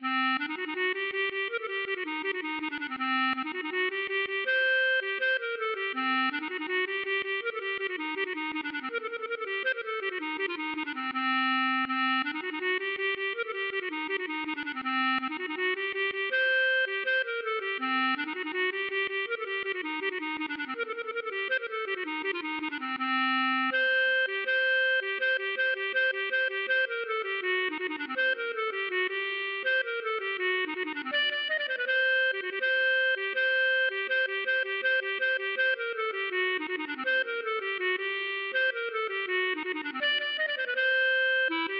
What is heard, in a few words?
klezmer